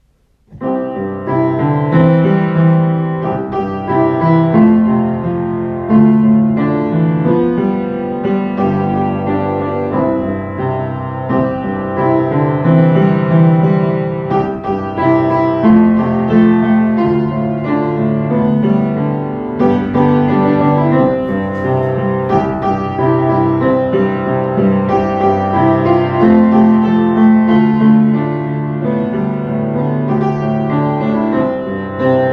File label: Cutie Baby Piano